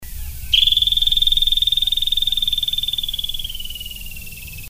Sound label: squirrel 2khz filter, yuma antelope